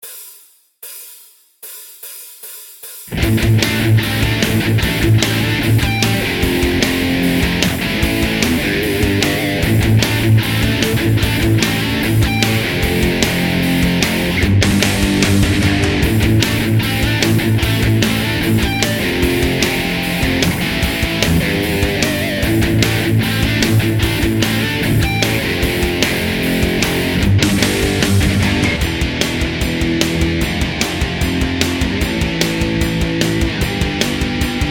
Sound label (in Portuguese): backing track modelos horizontais escala